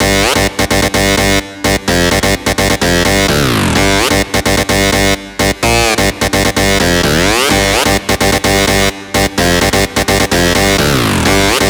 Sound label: 128BPM Drop Leads NoSC, Synth, Root G; Vengeance; Essential Clubsounds Vol.5; Demo Constr Kits; Blue Eye Kit